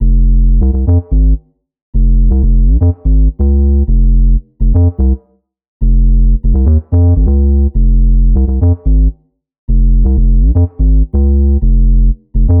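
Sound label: bass F minor 124; hello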